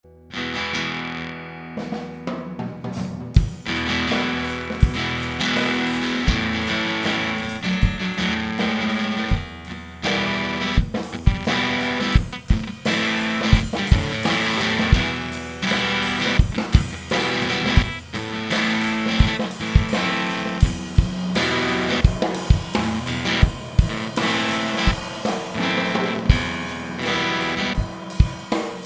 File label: Acoustic